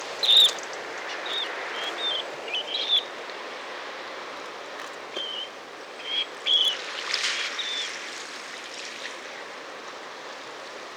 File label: Dunlin Calidris alpina, Ladakh; Order:charadriiformes; Stanba, Thiksay